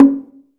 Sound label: LOG DRUM 2 LOW; AKAI S-Series CD-ROM Sound Library VOL-5